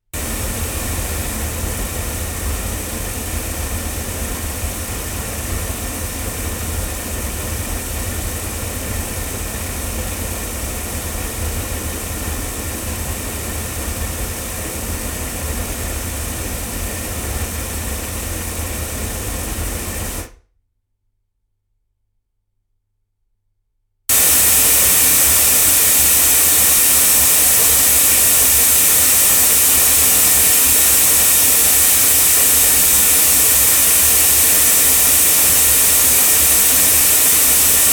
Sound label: omni loudspeakers